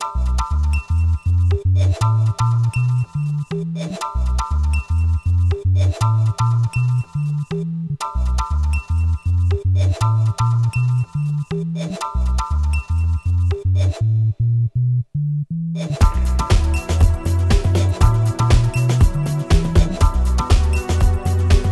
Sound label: jazzadelic